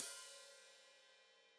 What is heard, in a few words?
Hat Foot Open, Hi-Hat; Deeply Sampled Drums